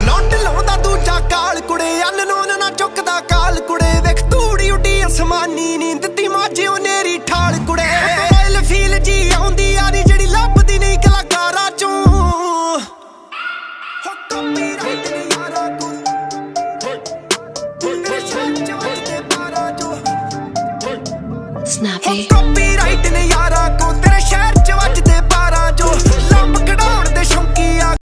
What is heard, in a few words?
Desi Punjabi Ringtone